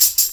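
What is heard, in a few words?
tambourine, Percussion; InVision Interactive; Percussion Slam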